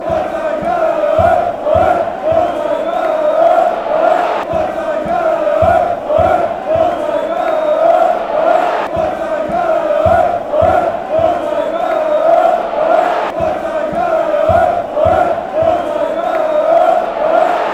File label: aek athens chant